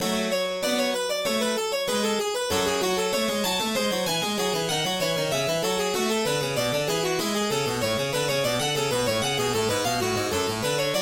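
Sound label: No14 in F Sharp Minor, MIDI; BWV 859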